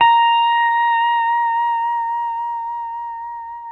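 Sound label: Pedal Steel F A#5; Sampleheads; Whole Lotta Country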